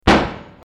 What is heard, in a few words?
car To Car Crash; sfx; teststrecke game